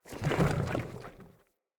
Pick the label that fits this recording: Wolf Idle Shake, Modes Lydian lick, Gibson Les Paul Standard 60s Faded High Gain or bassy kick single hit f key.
Wolf Idle Shake